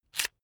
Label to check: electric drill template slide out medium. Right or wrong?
Right